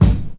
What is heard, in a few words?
clean kickdrum g sharp key; Mono